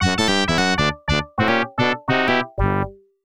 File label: Win Jingle